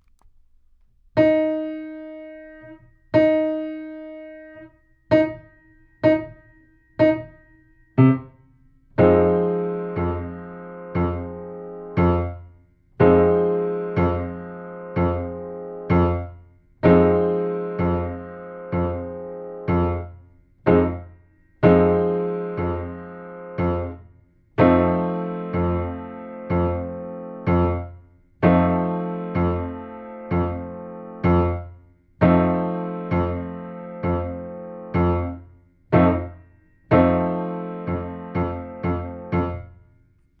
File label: Eb 8 bars, sax